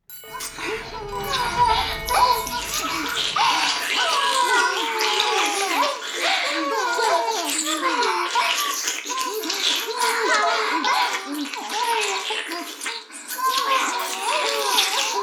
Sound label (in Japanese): 停止解除耳舐めフェラ音声; 逆時間停止されてストーカー後輩に